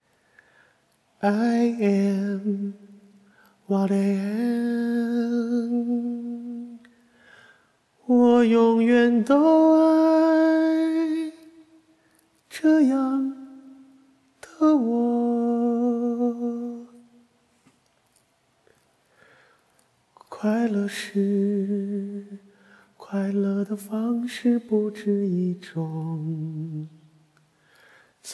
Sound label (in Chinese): 清唱